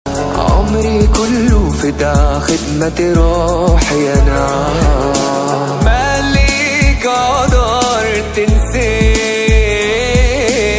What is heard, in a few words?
Arabic Song Ringtone